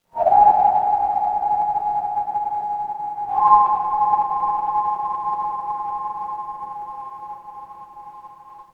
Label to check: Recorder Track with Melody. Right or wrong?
Wrong